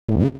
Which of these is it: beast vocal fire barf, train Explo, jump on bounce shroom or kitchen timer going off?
jump on bounce shroom